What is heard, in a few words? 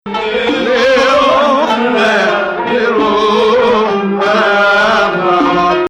Dalansheen Ijma3 Shufna 2nd Rast; The first half of this complete recording is an extensive and beautiful mawwal in Rast